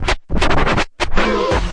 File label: turntable oh, scratching and turntablism; DRUM LOOPS and BREAKS